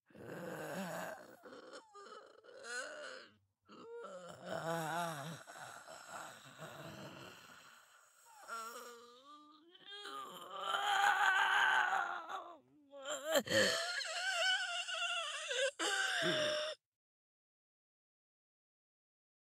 Intro Moans; devour 2021 gamerip pc macos

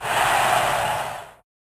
Crowd

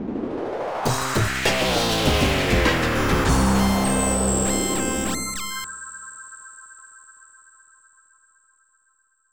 Game Over Jingle; crime scene; nds

